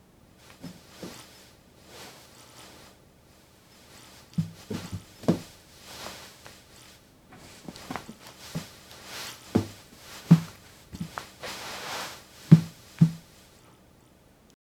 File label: gentle rustling of fabric, clothing